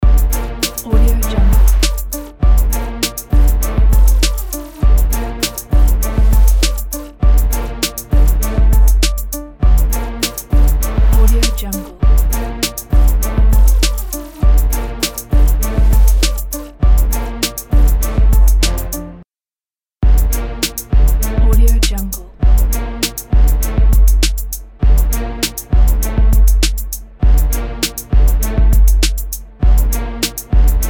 swag brassy loop

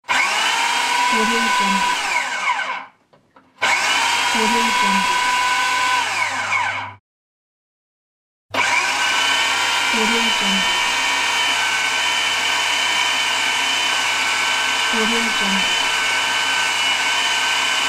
food processor on

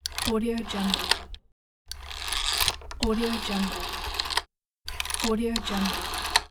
old phone dialing

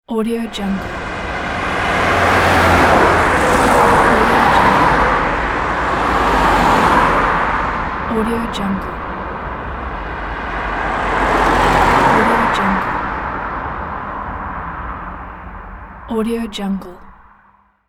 automobiles

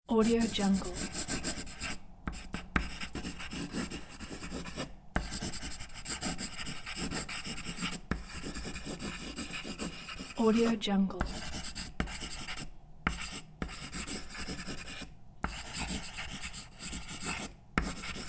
pencil; soundfxpro

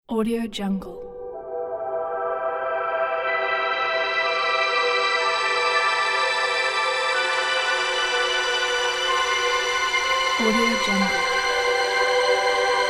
abstract soundscape

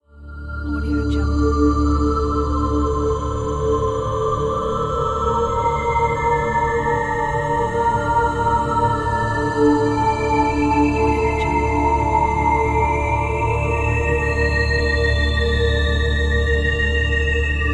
space dark logo

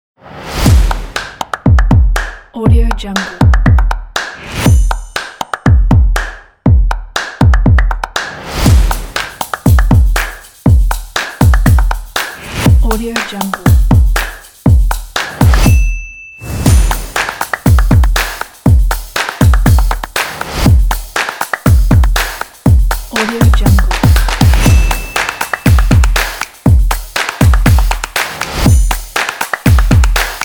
energetic dynamic promo

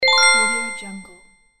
bonus

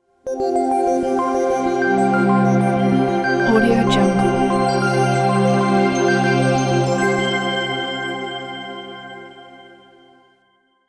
futuristic digital logo

